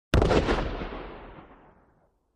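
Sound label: 1x8oz 2x16oz Black Powder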